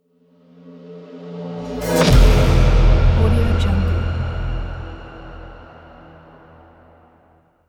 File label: dark cinematic logo button